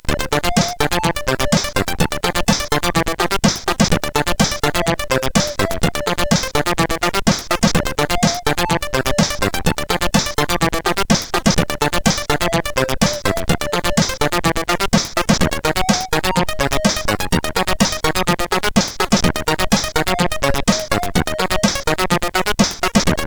Orcus Intro, CSG8580R5; hvsc

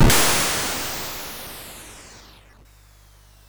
Frantic, MOS6581R4

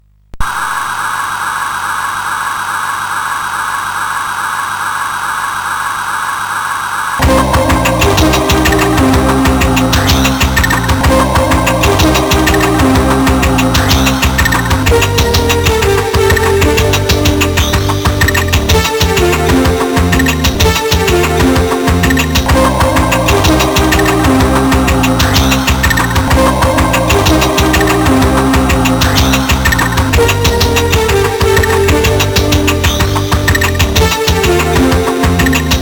digi, CSG8580R5

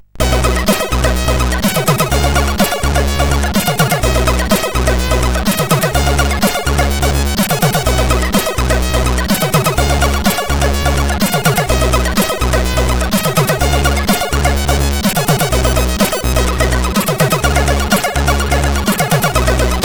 Tune 3, sid, CSG8580R5

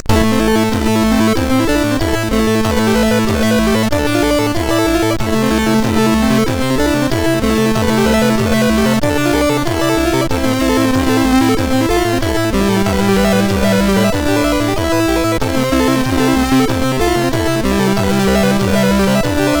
Tentacles Intro, sid MOS6581R2

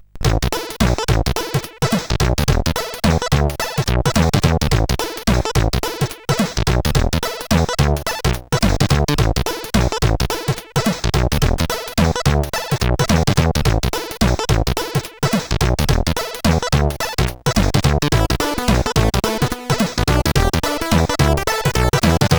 Funky, sid CSG8580R5

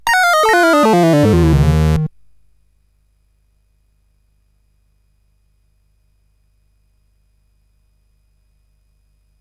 Game Music, sid CSG8580R5

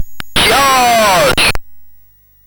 MOS6581R4, GAMES; Slapshot

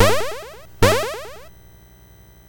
BASIC sid MOS6581R4, GAMES; Sword of Fargoal

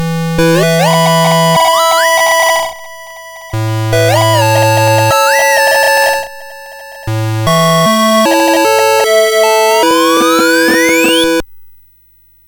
Musicbox, sid MOS6581R4

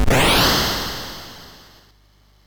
Project Firestart, MOS6581R4

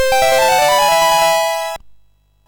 sid MOS6581R3, GAMES; Continental Circus

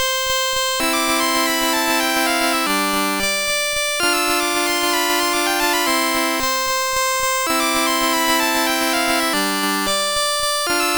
Waltz, MOS6581R3; Joseph A Grau

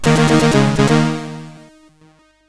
Outrun sid CSG8580R5

